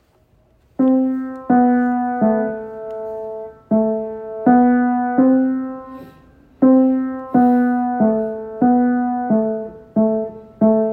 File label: Home Recorded